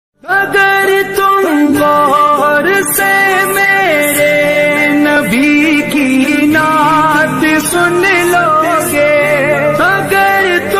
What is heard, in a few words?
Islamic ringtones